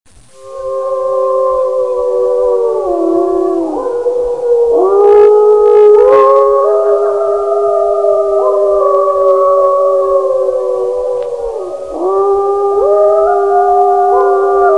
wilki

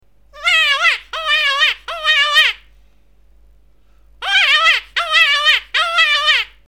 wabienie przestrach

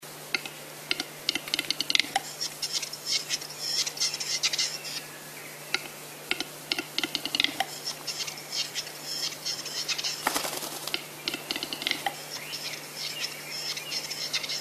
gluszec

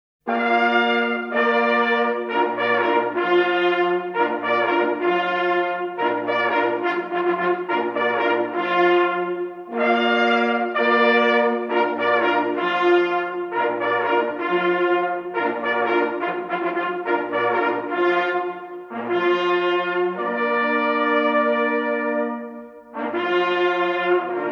jelen na rozkladzie, sygnaly

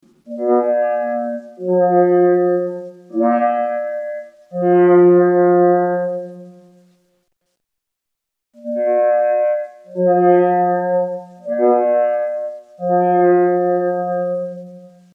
naganka stac i wyrownac, sygnaly

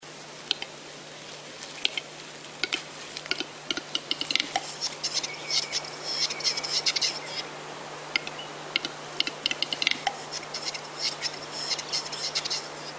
gluszec

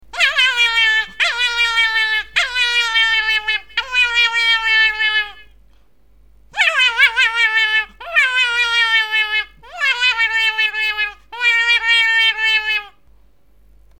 wabienie walka

